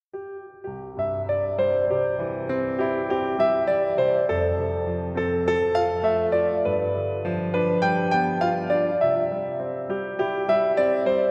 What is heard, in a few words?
Christmas Xmas Jingle Bells Grand Piano Instrumental